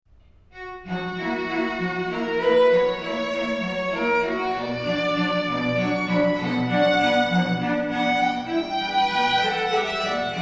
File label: strings; third floor